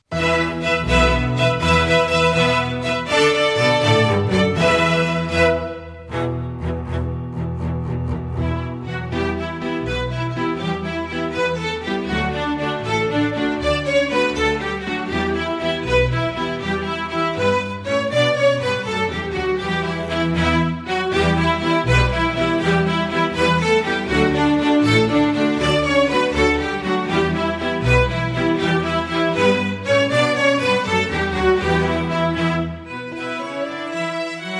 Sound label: Swallowtail Jig, practice file